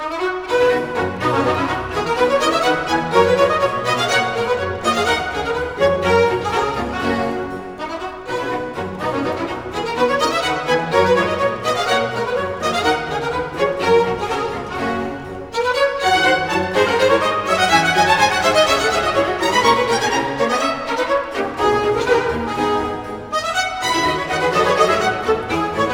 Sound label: pleasant Bourree